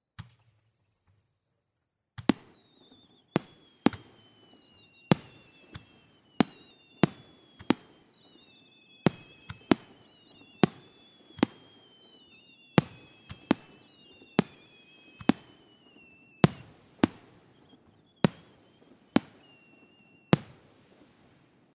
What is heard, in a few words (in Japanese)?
花火の音; firework